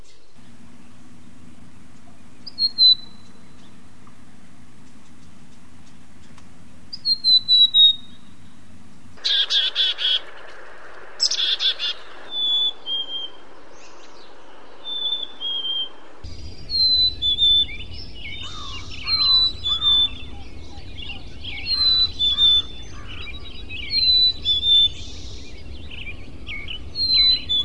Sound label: bc chickadee variation